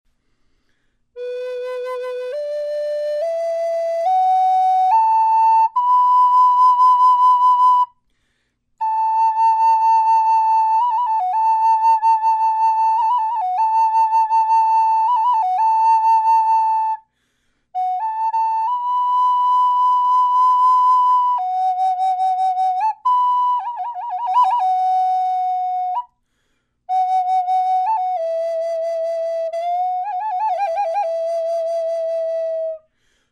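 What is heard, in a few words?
natural, flute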